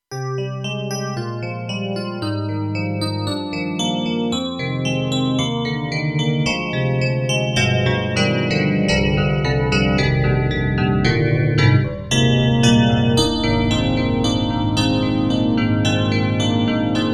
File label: Korg TR-Rack Brite FM Roads